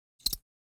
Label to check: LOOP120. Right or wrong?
Wrong